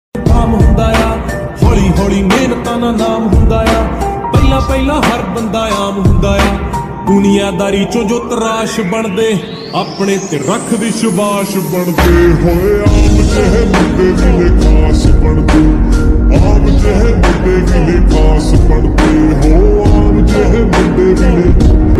punjabi india hindi sad song

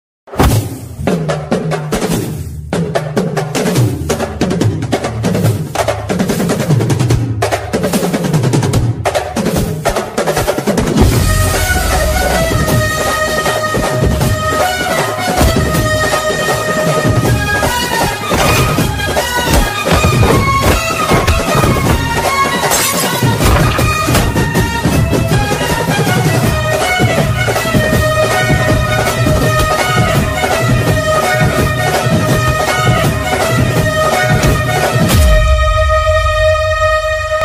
teaser bgm new tamil bgm ringtone attitude bgm; Fight Club

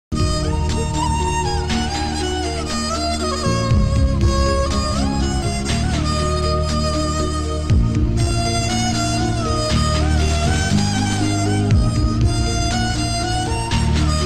climax sad bgm music; kushis movie; power star special theme music

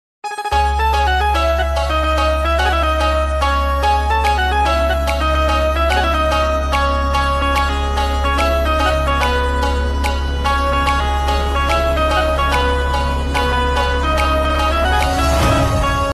instrumental ringtone

